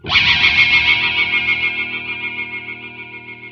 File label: WAH HAH, Distortion Gtr; PlatinumPhattCD2; E-MU Producer Series Vol. 8 – Platinum Phatt